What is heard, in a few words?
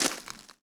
gravel; firefight